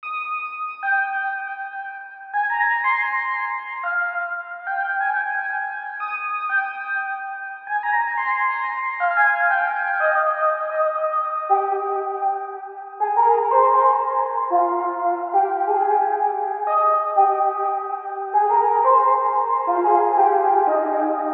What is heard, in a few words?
180 BPM F Min Lead, Guitar; Loop Stems; Cymatics; Miami Guitar Pack - Pt 2